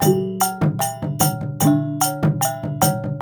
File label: WYANG L, Heart of Asia